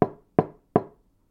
knock on thin board; knocking

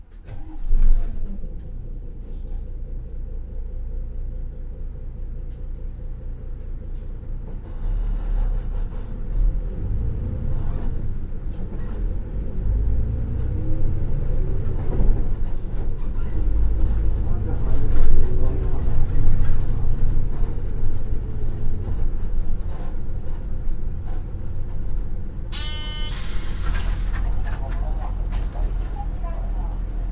on bus

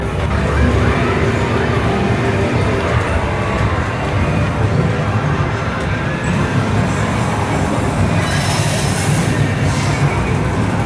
arcade

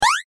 jump

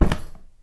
put large bag

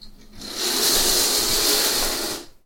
long curtain

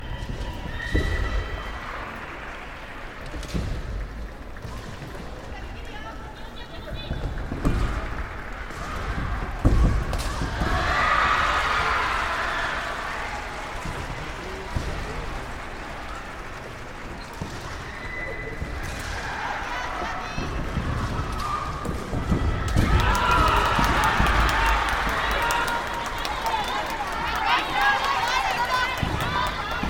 womens Kendo

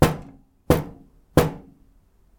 knock on microwave; knocking

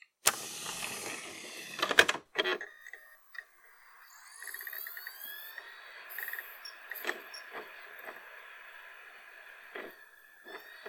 close disk tray

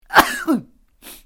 man sneezing; human

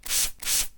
sprayer

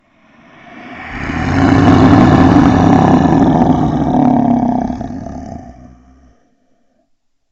monster snore

